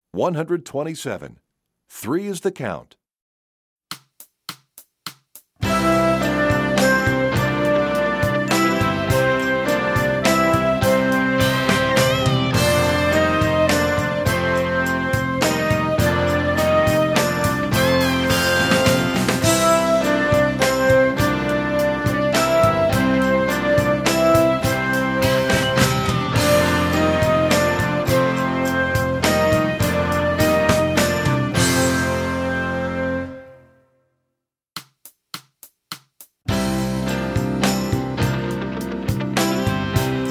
Three Count, Brass WW; EE2000 Book1

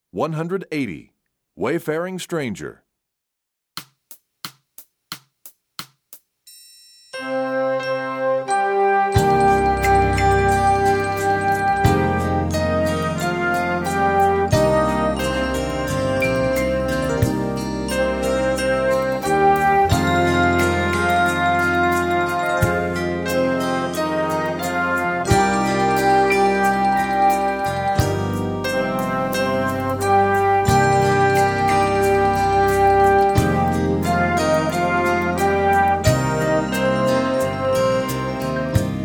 Wayfaring, Brass WW; EE2000 Book1

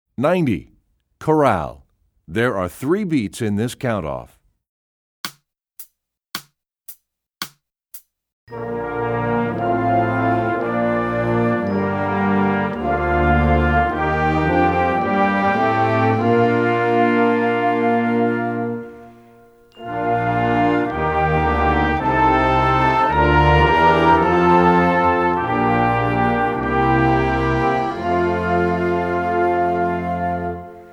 Chorale; EE2000 Book2

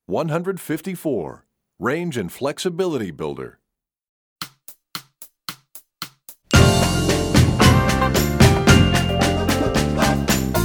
Range, Percussion; EE2000 Book1

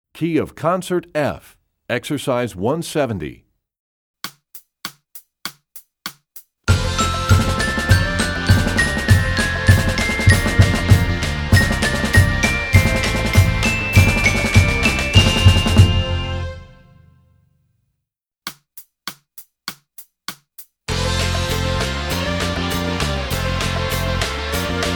Studies Concert F, Percussion; Rubank; EE2000 Book2

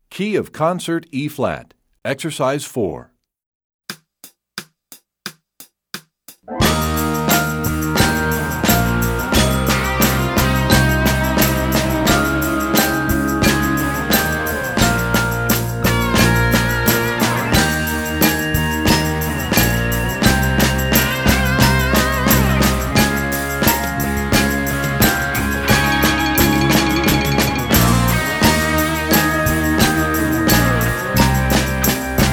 Concert E flat, Percussion; EE2000 Book1

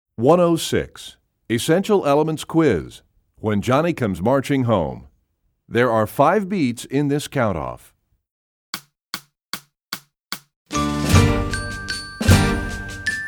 Percussion; EE Quiz; EE2000 Book2

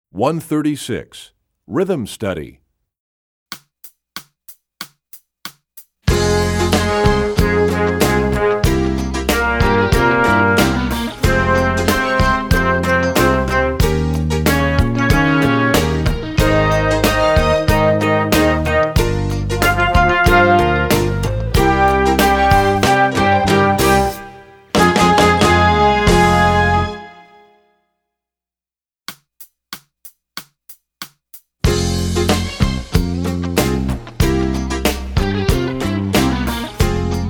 Rhythm Study; EE2000 Book2

